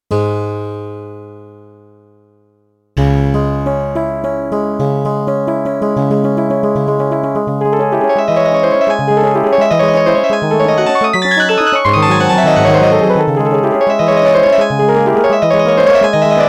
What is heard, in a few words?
Piano